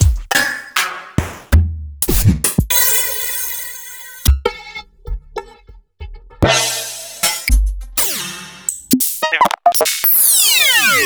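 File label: Roland MV8800 drum KIT; HITEKNZ KIT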